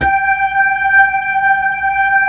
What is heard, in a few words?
B-3, Blues; Keyboards of The 60's and 70's